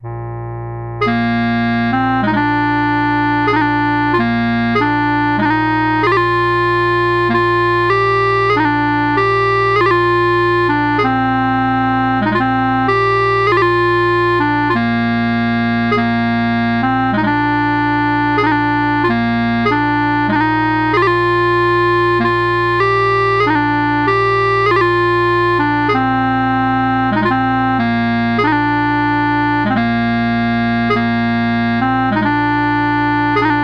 Green Hills of Tyrol, I See Mull, When The Battle is Or, pipes, 50 bpm; 3/4